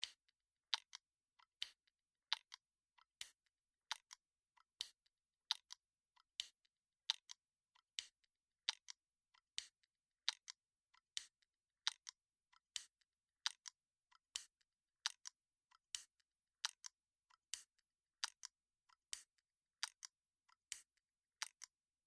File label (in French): Canette